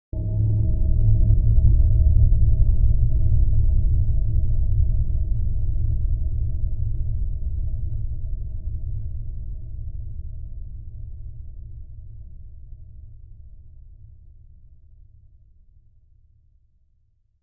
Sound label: Bell Pulsating Chime